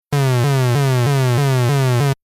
synth lfo saw

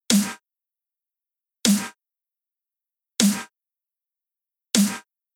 Delay Non Effect

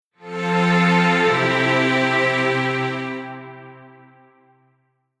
Strings Wasei

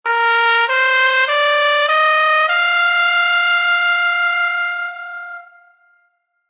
Horn Flutter

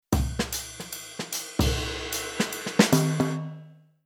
Rhythm Modern Jazz

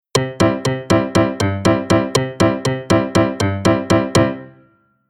Rhythm

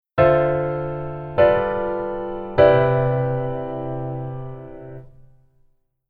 Keyboard Voicing Hi

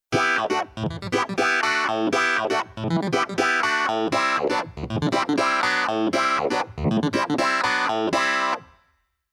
keyboard clavi funk clavi

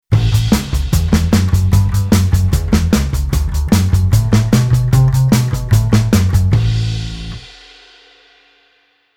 bass rock n roll

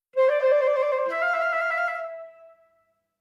trill

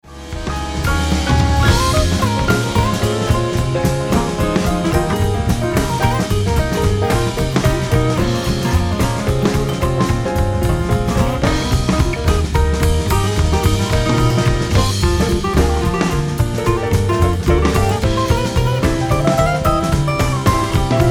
Gt Solo

